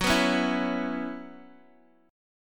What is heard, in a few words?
Gb 7th Suspended 4th Gb, Guitar Open G; chordshape; down